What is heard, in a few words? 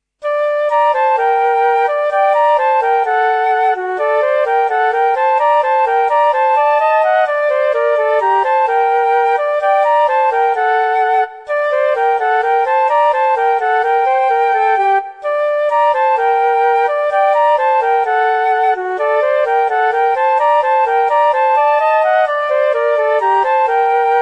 Klezmer Fl duet; voordrachtstukken